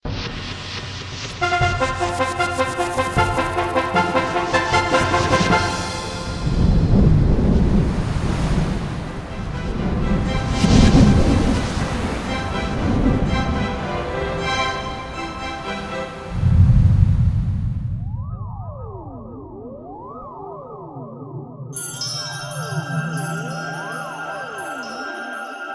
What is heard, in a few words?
plant warp; R.O.S.E